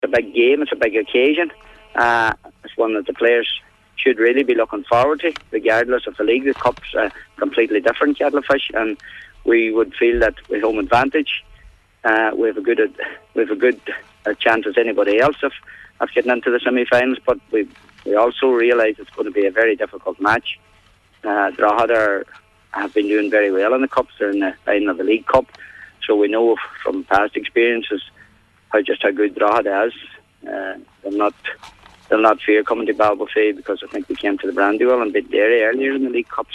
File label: HARPS